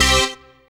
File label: dance chord